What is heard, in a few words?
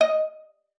D#5, VIOLA PIZZ; Xsample VOL-5; 90 s Sample CDs